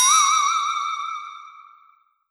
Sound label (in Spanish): conejo